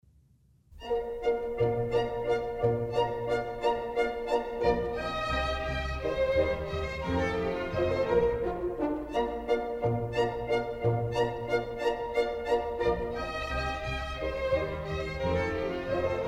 German Dances, Bb major; Mozart; Dances and Marches

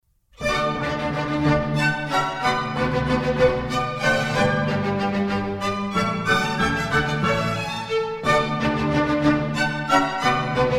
German Dances, D major; Mozart; Dances and Marches

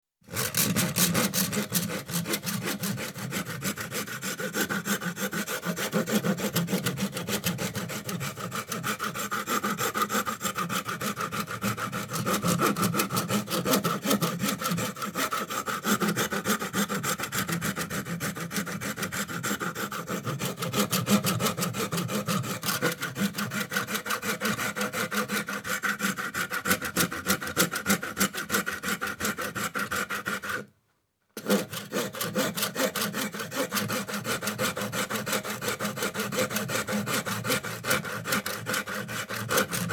Planing, Piece Of Wood; Construction; BBC Sound Effects Library

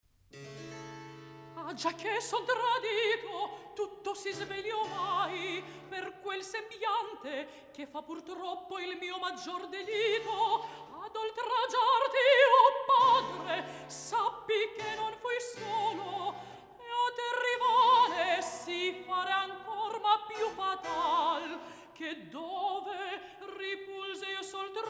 Recitativo; Mozart; Mitridate, Re di Ponto; Ah giacche son tradito

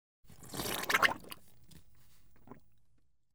slurp; Comedy, Fantasy and Humor

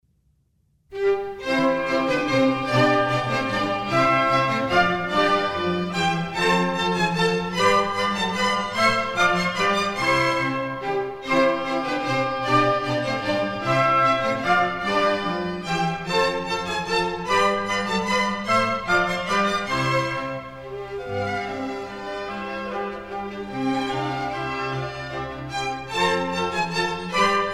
Minuets, C major; Mozart; Dances and Marches

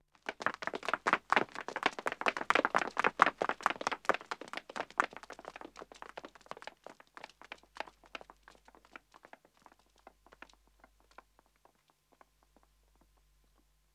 Pavement Running 2 men & 2 wo, Footsteps; The BBC Sound Effects Library

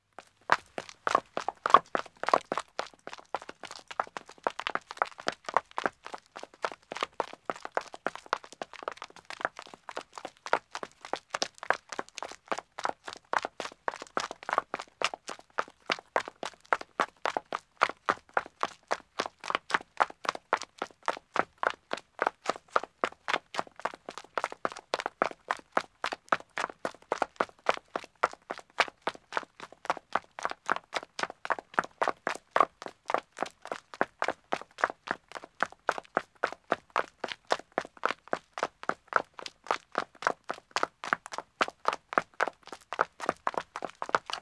Country Road Running 2 women, Footsteps; The BBC Sound Effects Library

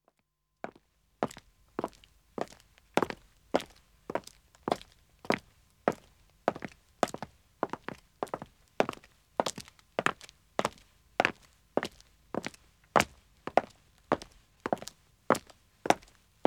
Pavement Walking 2 men start, Footsteps; The BBC Sound Effects Library

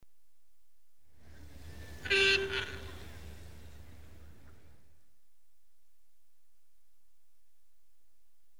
Show Jumping - hooter beginning of round; Hickstead; BBC Sound Effects Library

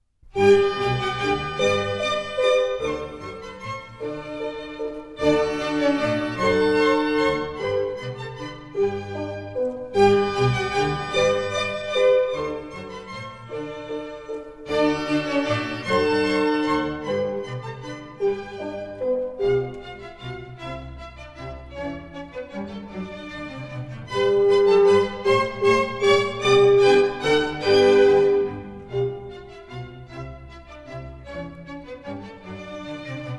Minuets, G Major; Mozart; Dances and Marches

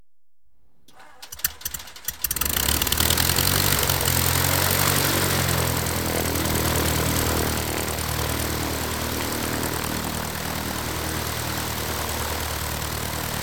START UP, RUN, SWITCH OFF, Aircraft; The BBC Sound Effects Library

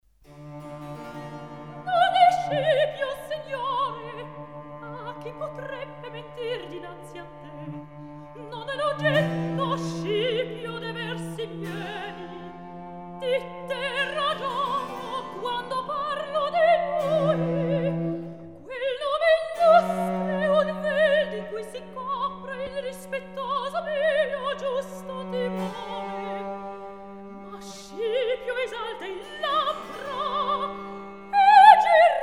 Recitativo; Cantata Di OMaggio; Non e Scipio; Mozart; Il Sogno di Scipione

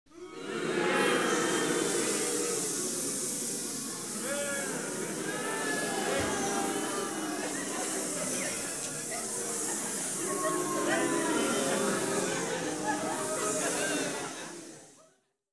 Light Entertainment Audience - Boos And Hisses; Rec. At Bbc Paris Theatre

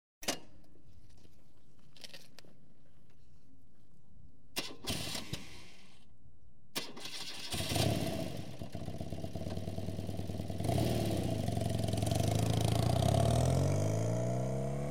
bmw off stand, false start, drive off; Transport; BBC Sound Effects Library

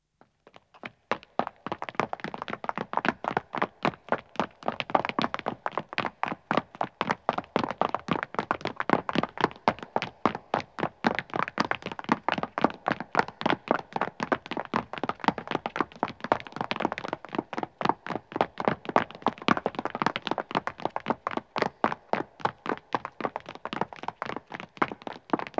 Stone Running, 3 Men Start, footsteps; BBC Sound Effects Library

